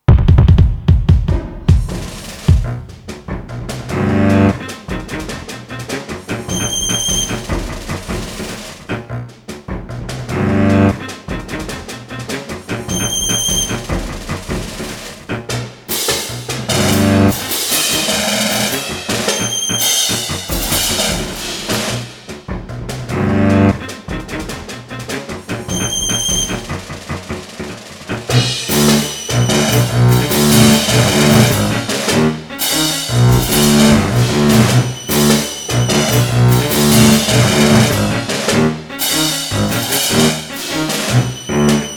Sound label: violin